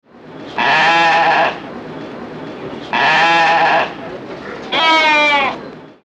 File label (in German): Mufflon vorjaehrig